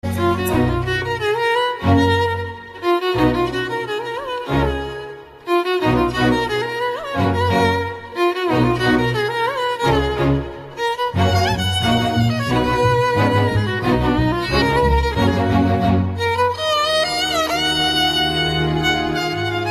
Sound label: great violin track